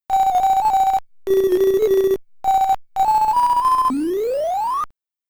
Miss; Make Trax; Arcade

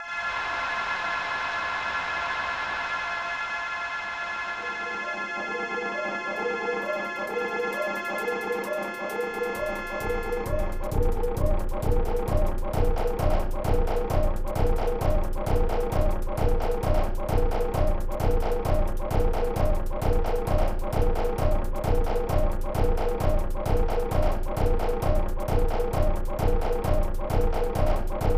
Soccer Game Drumbeat

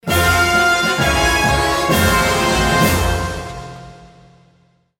Stage Lost

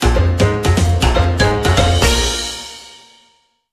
Jingle Bye

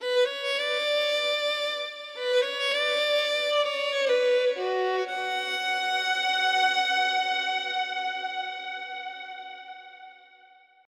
Full Moon Cello; Legend of Zelda The Link's Awakening 2019 Remake

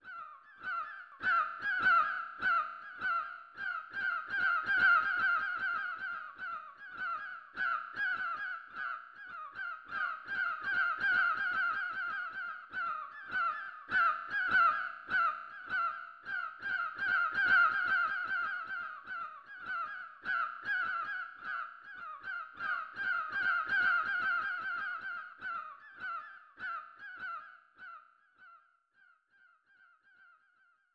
Seagulls; marvelous; snes

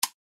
Plastic Switch

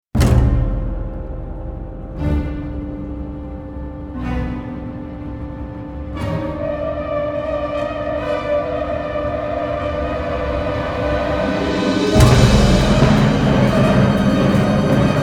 Heartbreaking Sounds; Soul Sacrifice

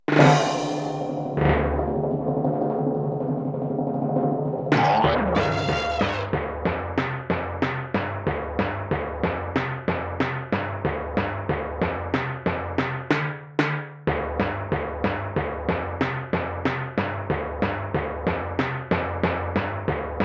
BGM rhythm

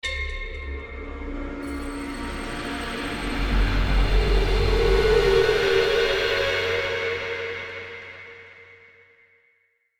Stinger Decrease Action

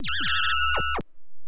Coin; Armed F; arcade

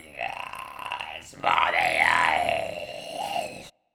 attack; baal

